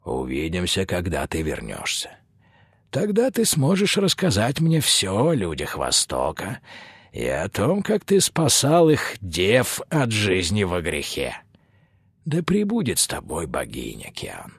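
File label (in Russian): garmon, accordion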